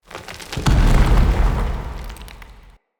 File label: Shrine Temple Door Open; Glades